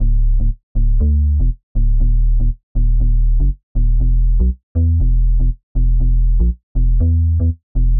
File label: BASSLINE, BPM 120; baseline junkyard